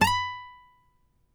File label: NYL B, FLAMENCO NYL; Spectrasonics; Hans Zimmer Guitars VOL-2